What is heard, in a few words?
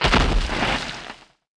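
footsteps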